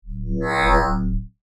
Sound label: Electronic Pulse, Ray, Science Fiction